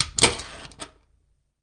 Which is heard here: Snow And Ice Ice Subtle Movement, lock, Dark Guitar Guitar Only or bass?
lock